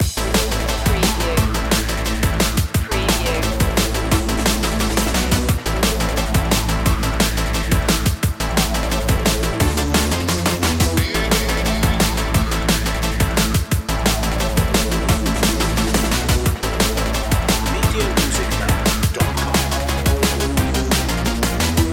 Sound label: massive loop